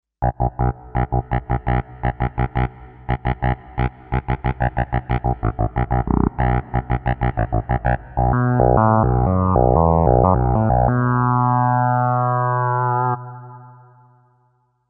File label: Pulse, Kawai K5000S